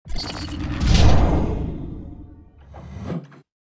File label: Regenerate